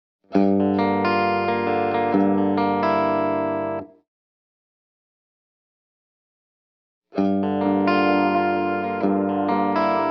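Vintage Oil Can Echo, Bright and bouncy repeats that decay beautifully, don’t overpower the dry guitar sound and scatter across the stereo field.; Bring Out Your Dead, Man; Olivera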